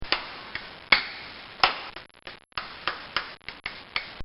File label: Blacksmith Nailing A Shoe To The Hoof; Horses